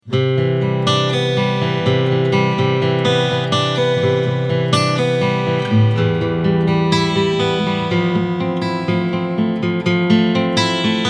Takamine GN51 Nat Picking Pattern

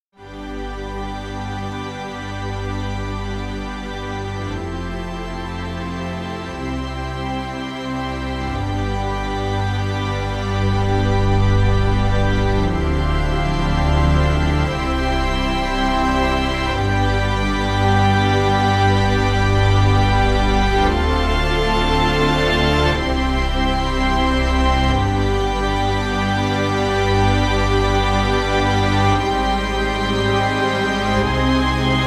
Preset Cinema Octaves

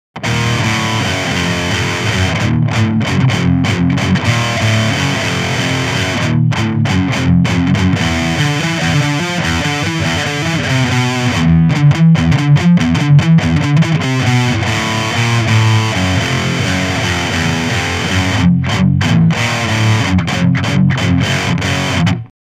Boss ME 90 Direkt Recti Stack

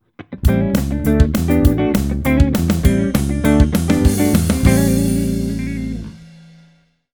pick

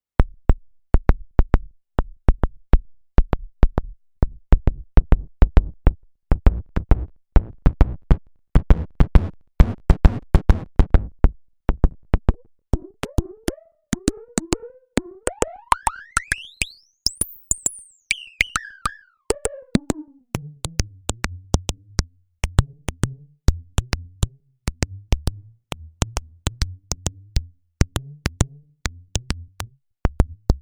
Drums

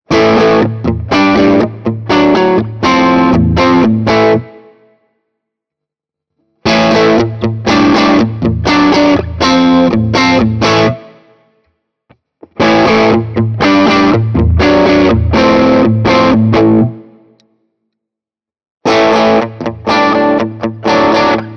Ibanez AR520HFM LBB crunch all positions riff

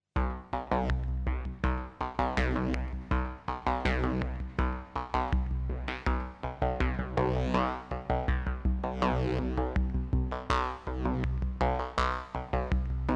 Moog Labyrinth Sequence

